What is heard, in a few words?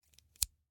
Scissors, snap, snip, shear, small med, single